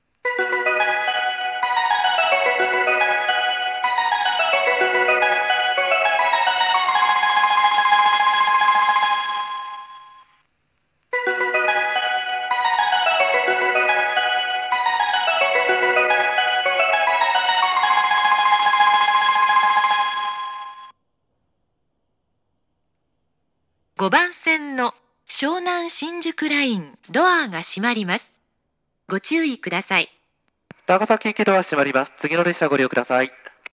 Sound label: Odawara Melody